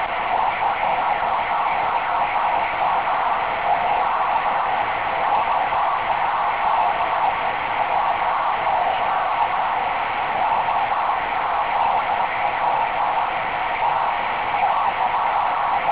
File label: ssb, Aurora; VHF